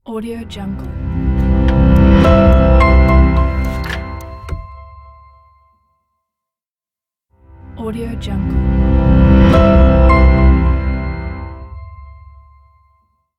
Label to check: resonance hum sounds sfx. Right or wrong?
Wrong